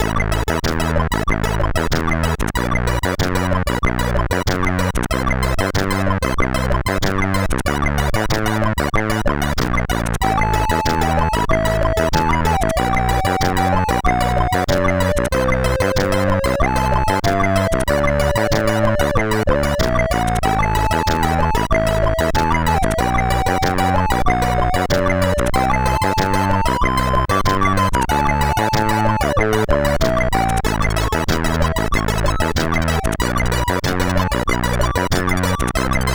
New Bossa, sid CSG8580R5

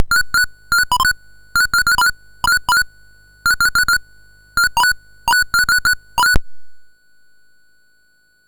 Dragonworld sid MOS6581R4

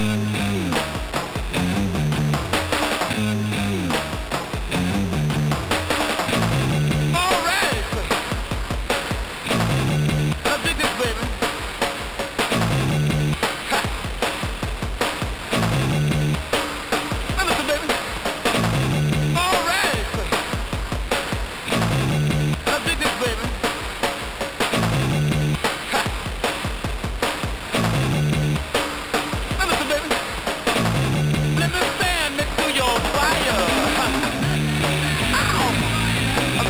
Fire, sid MOS6581R3

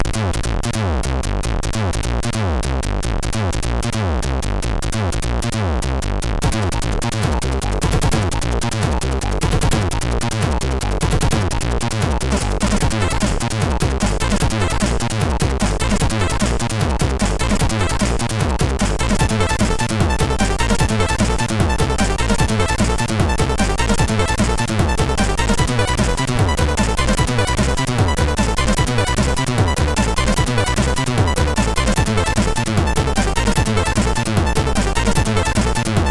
Techno, MOS6581R3; sid; hvsc

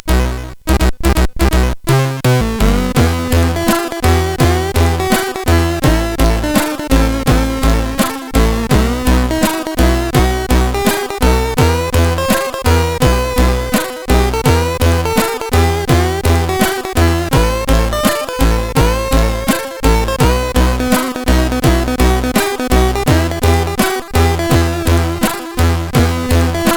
Tango, sid CSG8580R5

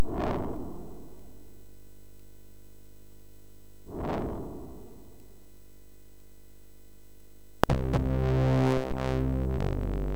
MOS6581; MOS6581R3

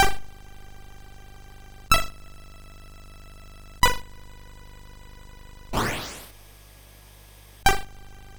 Breakout Remake, sid MOS6581R4

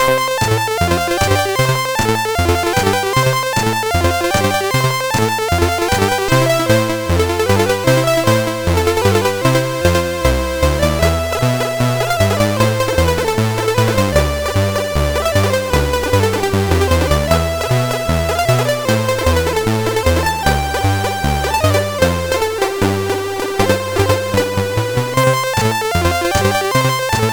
Paddle Mania, CSG8580R5

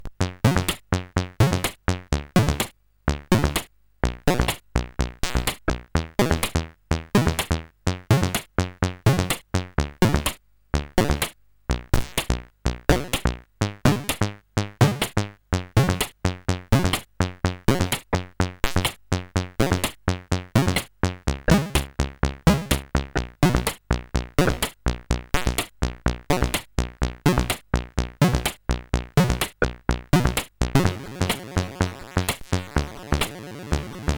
Electronic 256 bytes, sid CSG8580R5

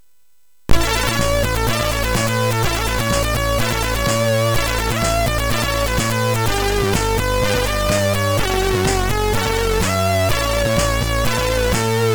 Music Demo Version, MOS6581R3; hvsc

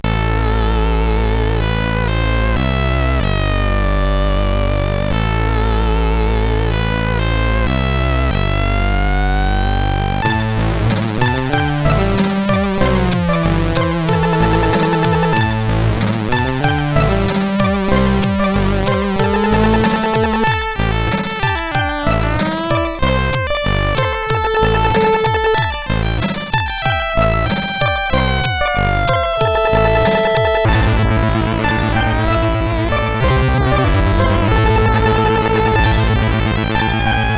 Oriental Extase, CSG8580R5; sid

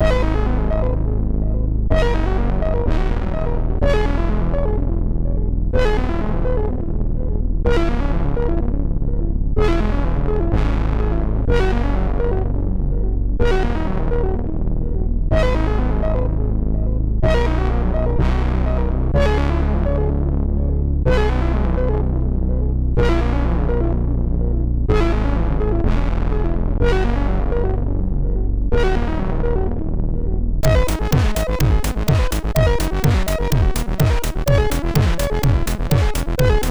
sid MOS6581; hvsc

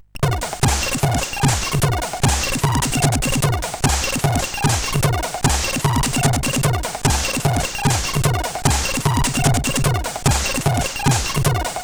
Anthem, sid CSG8580R5

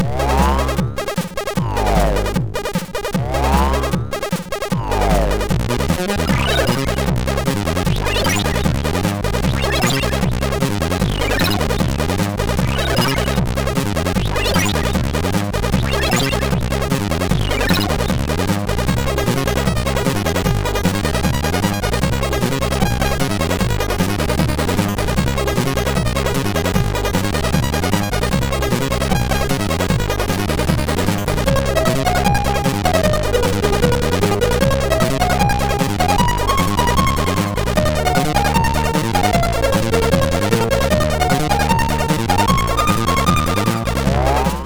Banjo, MOS6581R3 sid

